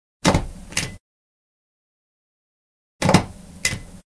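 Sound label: Stapler; 100 sound effects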